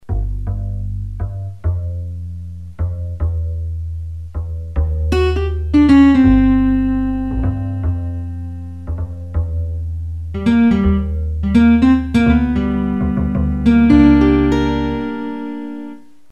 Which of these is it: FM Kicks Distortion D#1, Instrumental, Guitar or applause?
Guitar